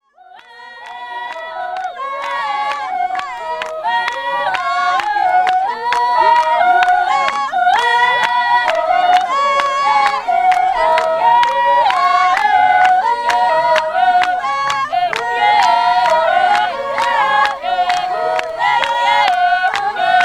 pygmees

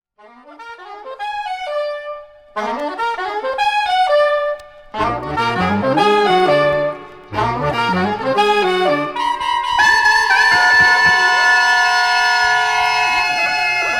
le quatuor de saxphones

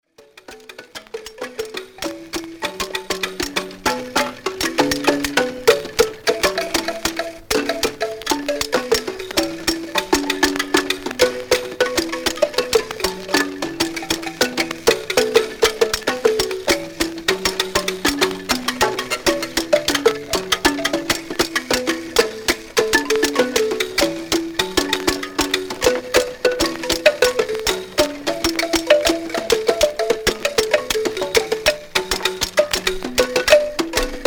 musique centrafricaine

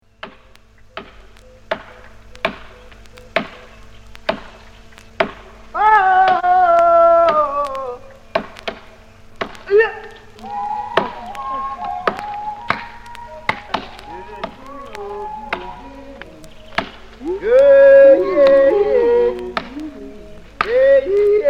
pygmees moyen congo

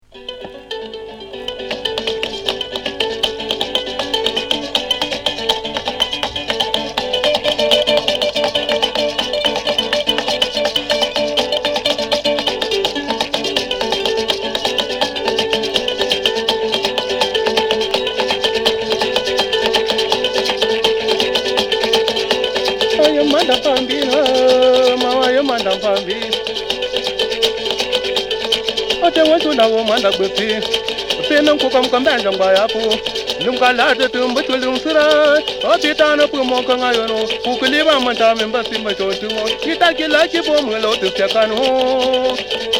musiques du cameroun

